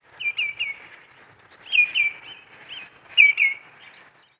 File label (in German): Gruenschenkel; Plessenteich NU; Daniel Honold